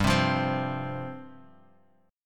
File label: G 7th Suspended 4th G down; G7sus4 strummed